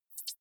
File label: hover high, signale